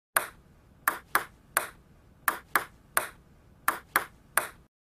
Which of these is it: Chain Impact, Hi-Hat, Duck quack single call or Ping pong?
Ping pong